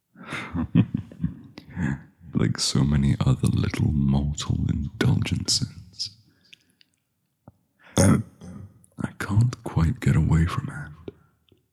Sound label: stupid burp